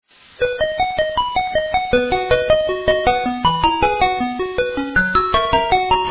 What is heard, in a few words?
Music